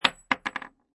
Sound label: Bullet Drop Rifle Shell, Empty bullet shell drop and impacts with metal; Bullet shell drop metal. Empty bullet shell drop and impacts with metal surface.; Impact Sound Effects; Lynne Music